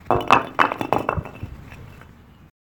Brick Falling

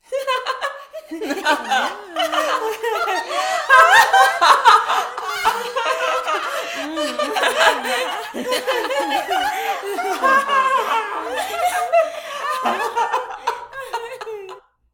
Demon Witch Laughter No Effects

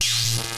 Electric spark

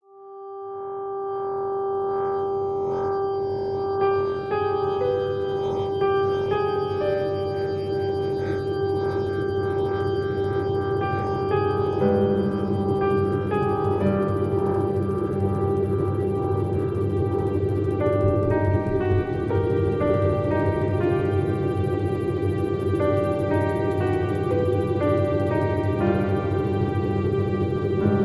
Ominous

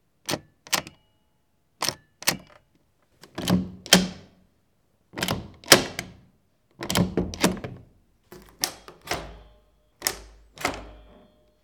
Several Different Handles

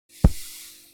dust throw thud

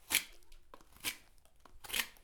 Sharpening piece of wood

